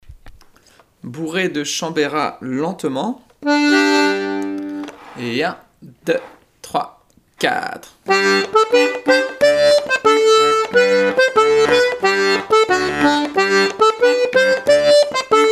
Bourrée de Chambérat (2 tps); ateliers diato 2; L'atelier de la casserole

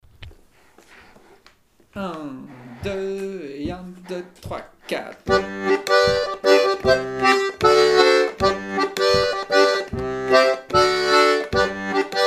grille impro; ateliers diato 2; L'atelier de la casserole

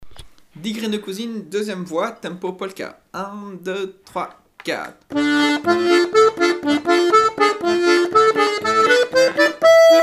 2e voix vite; ateliers diato 2

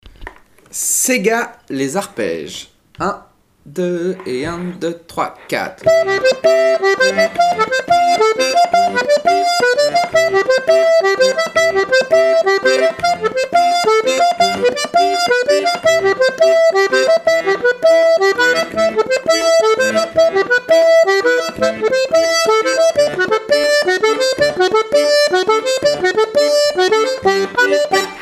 sega arpeges; ateliers diato 2; L'atelier de la casserole

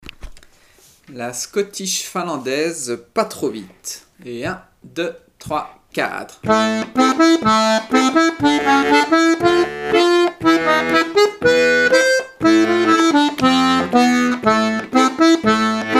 scottish finlandaise

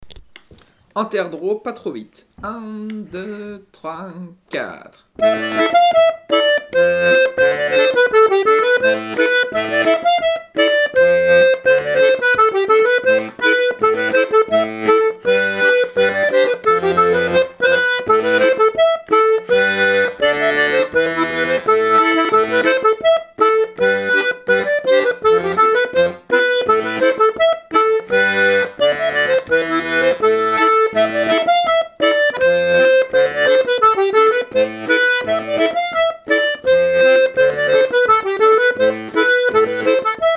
hanter dro

